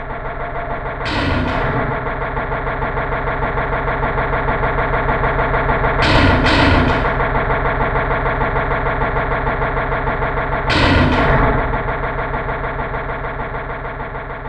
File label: scared